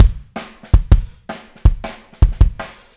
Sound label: Kick, 162 BPM; Verse; Drums